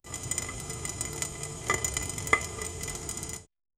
a kitchen sound in the; 80s

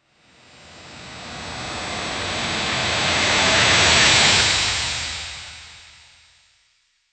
bandage sound effects; wrap

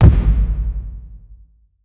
reward

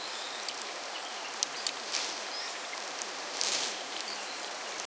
layered jungle ambiance

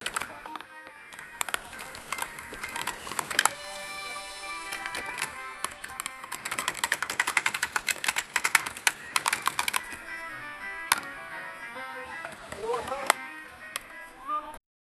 cartoon playing in the ba

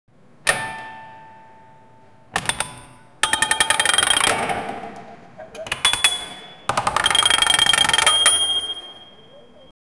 chime from pinball with s

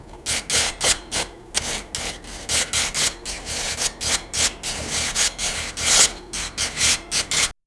scraping knife being pick; cutlery

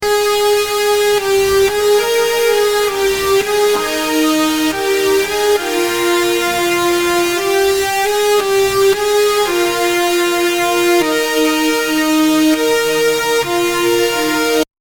like song, alternative rock

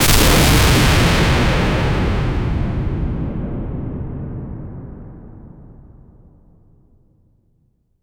a cinematic lightning bla

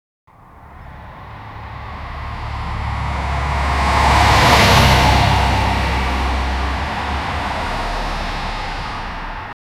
accident vehicle sound, wreck

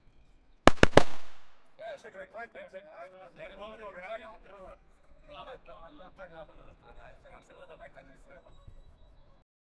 circus cannon weak

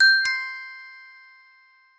a fantasy little jingle

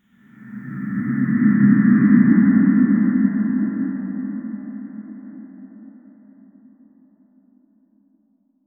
reverb heavy motion b; motion blur

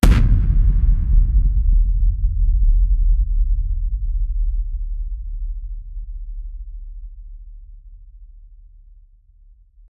big bang

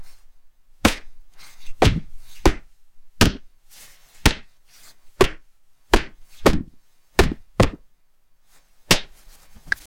fighting sound, hard hit

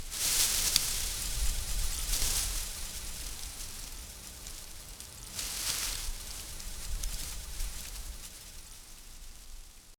natural sound of multiple, field recording

